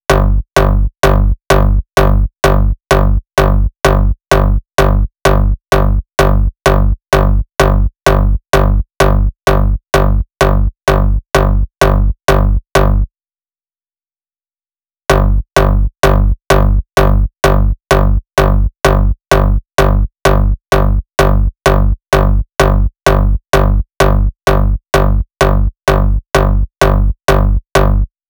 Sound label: 128BPM Drop Bass, Root G; Vengeance; Essential Clubsounds Vol.5; Demo Constr Kits; Blue Eye Kit; Bassline